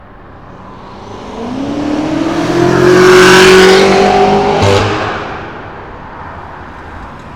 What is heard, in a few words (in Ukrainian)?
Вибух звуку мабуть мотоцикл